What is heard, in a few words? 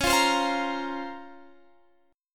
Db Major 13th, Guitar Open F; strummed